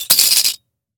Handcuffs Clicking

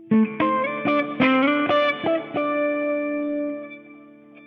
Les Paul Guitar

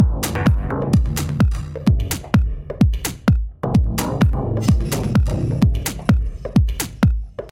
Minimal House

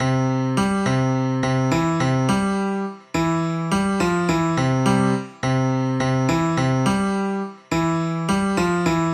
Grand Piano Loop

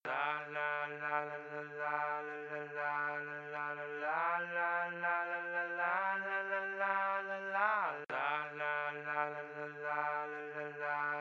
La La La Vocal

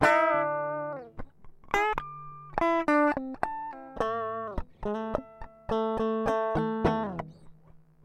Guitar Harmonics

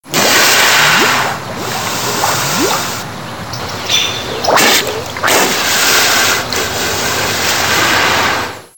Hissing Sound

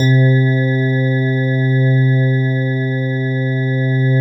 Dx Piano Chord C3

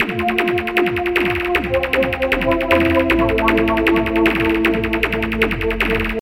Percussion n Synth

